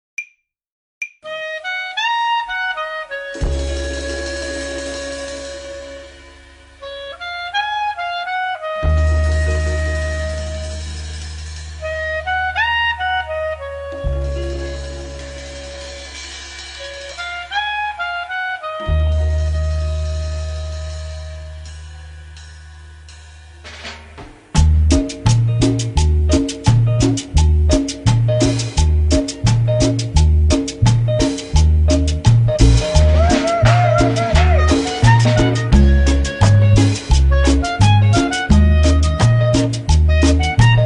cumbia sobre, saxophone

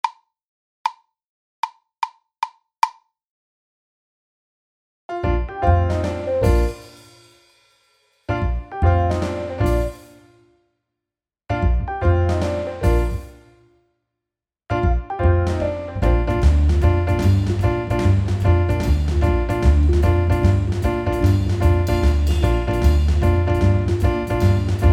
saxophone; mib

